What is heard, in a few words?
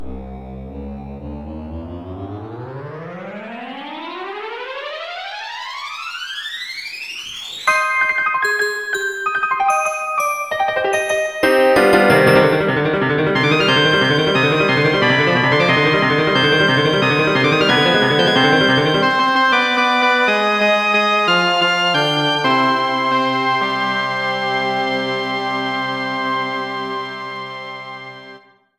MIDI Frantic Intro; Furdiburb; Android